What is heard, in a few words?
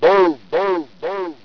boom